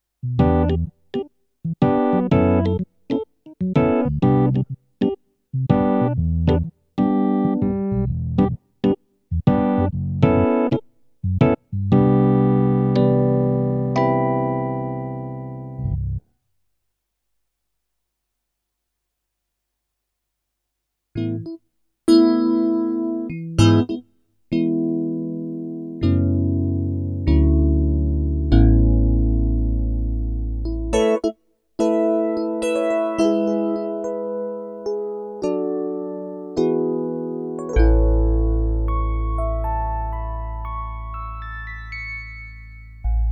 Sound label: F120 EPiano